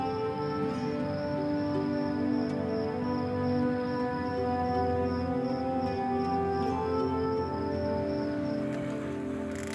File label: Flutes Transition